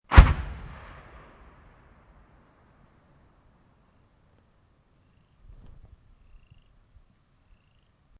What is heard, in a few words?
Mortar Distant Impact; VRSonic; Guns